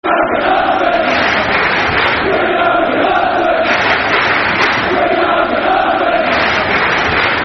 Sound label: Football Chants (Soccer Songs); Gunnarsson; Reading Rfc